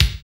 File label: BD, BD Dance